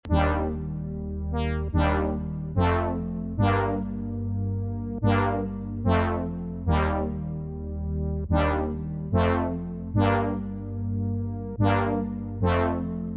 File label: Synth; H Jays; FOCUS Preset Pack